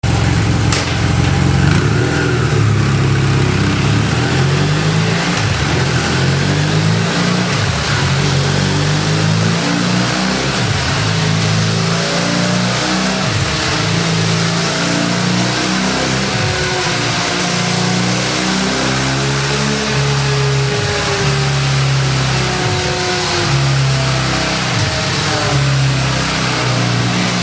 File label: BMW F800GS OVAL, exhausts